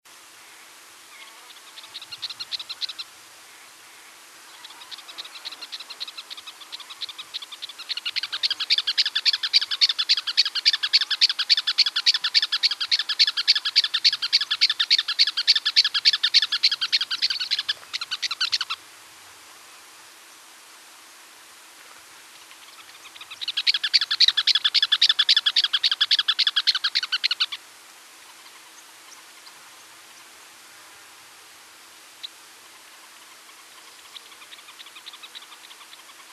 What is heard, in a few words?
Campo Miner